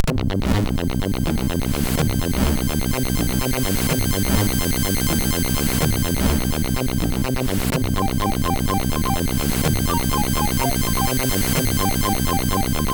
atmosphere; SIDTunes

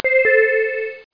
Sound PING; Anime Pin-Up Beauties

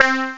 warmth; The Epic Collection 3; Epic Marketing; muzak samples

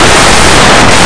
box; Blue Heat

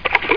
splash; ProOne: Netcracker Netscape Navigator

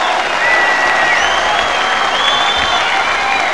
Applause; Polyominoes

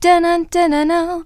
UOW, Vocal Samples

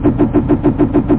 VENTIL

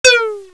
high bing; KABOOM! - Wild and Wacky Sound Software; beeps boings

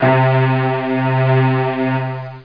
Strings C2; Flashback

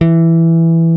jazz ba; DirectX SDK; DMusProd

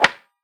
pickup axe; Gold Miner Joe

